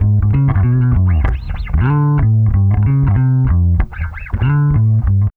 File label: BASS, Funky Ass Loops; East West